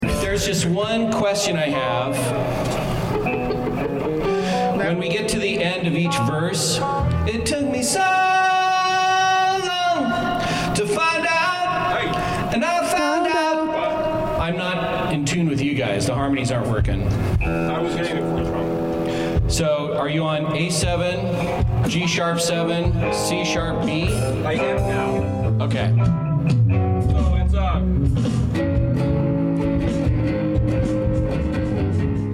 Background Chatter

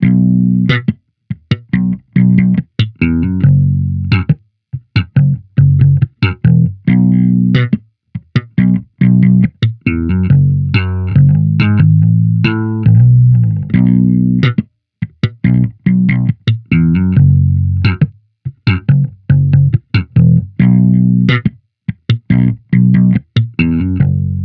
Amped loop 70 F#m, Electric Bass Loops; Slate Digital BASSHOLE Sample Pack

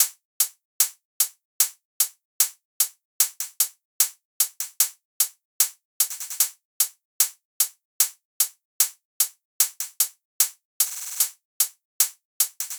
hats 150, Hat Loops; drum sample pack; Udina; SEISMIC; Slate

